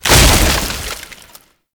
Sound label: Gate Destroy; Gameplay; Awakening Remaster